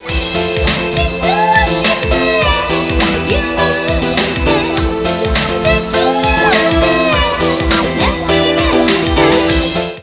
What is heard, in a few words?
melody